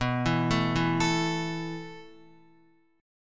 B 7th Suspended 4th B down Guitar; B7sus4; arpeggiated; JGuitar

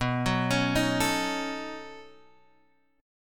B 9th B; B9 Chord {7 X 4 6 4 5} arpeggiated

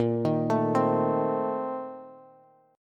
Bb Major 7th Suspended 2nd Suspended 4th, Bass; arpeggiated

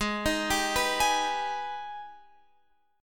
Ab Minor Major 7th, down, Guitar, Open F; arpeggiated; AbmM7

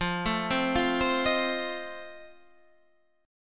F 7th F down Guitar Standard; F7 arpeggiated

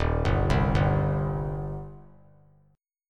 D sharp 6th; arpeggiated; JGuitar

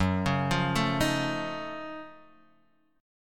Gb Minor Major 13th, GbmM13 chord; arpeggiated; JGuitar

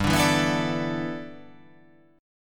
G 6th Add 9th

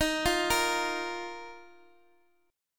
Eb Suspended 2nd, Guitar Open G

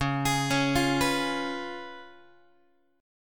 Db 7th Db down Guitar; arpeggiated; x,9,11,11,9,10; JGuitar

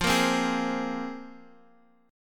C 7th Suspended 4th Sharp 5th, guitar

